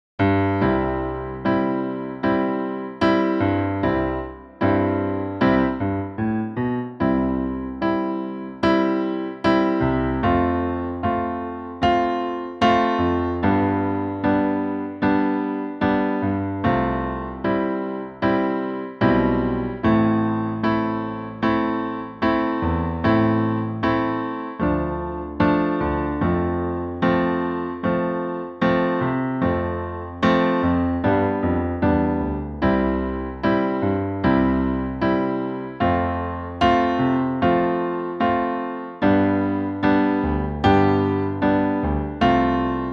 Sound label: solo for gent chords